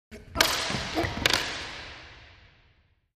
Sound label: Racquetball; Body Runs Into Wall With Vocal Effect